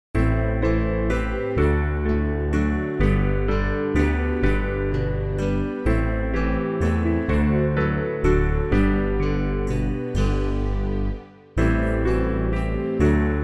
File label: Who May Ascend backing; New Hope Music